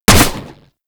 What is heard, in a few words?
Auto Crossbow Shot; Warframe; Weapons; Tenno